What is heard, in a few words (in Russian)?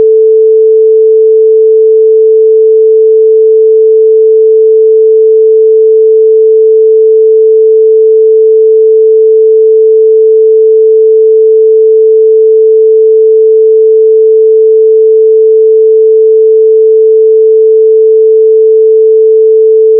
kamerton